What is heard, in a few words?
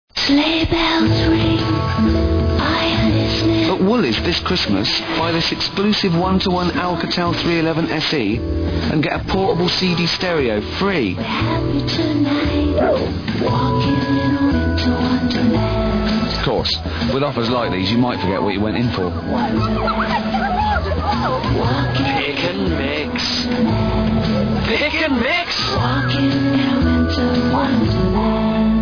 8bit